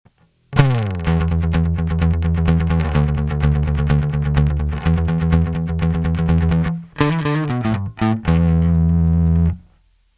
Walrus Audio Badwater Drive EQ Drive 100 Blend 50 LF Compressor 100